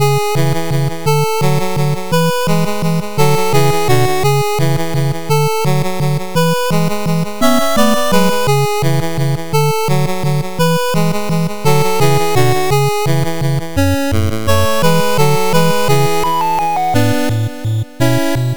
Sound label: snow bpm85, 8bit action stage select